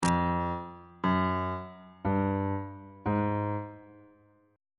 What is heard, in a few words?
e sharp 2nd interval pitches on bass clef